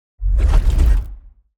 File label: Full charge; TANK; 3Souls